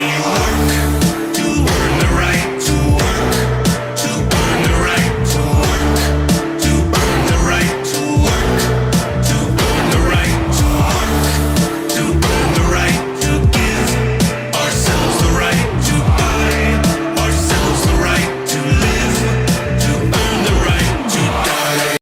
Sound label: R chant